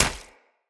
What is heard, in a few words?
Fey Flock Attack Hit; Powers